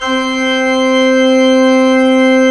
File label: ORG, CHURCH ORGAN; Roland - LA Composer vol. 1